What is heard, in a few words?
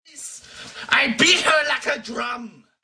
beat her like a drum